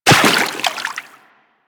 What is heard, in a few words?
Walk Water, footsteps; Warframe; Operator